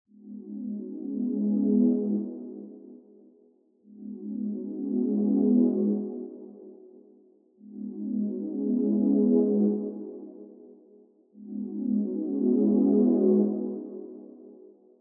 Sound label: Pad; PatchFactory